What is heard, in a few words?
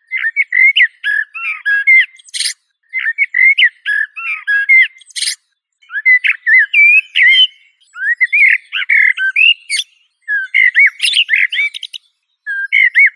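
blackbird, Turdus merula; drozd chernii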